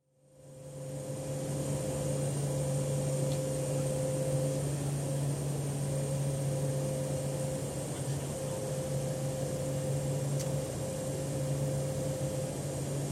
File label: Shorts 360 Commuter Transporter, interior, land, taxi to halt (2 turboprop engines); Airplane Sound Effects; BBC